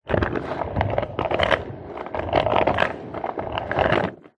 industrial dolly trolley wood small on wheels short roll on wood; zapsplat